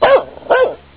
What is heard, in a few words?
Dog